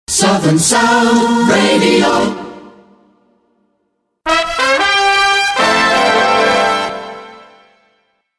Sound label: extra jingles; Southern Sound; possibly on a demo; The instrumental was used on the Timbo show in 1984/1985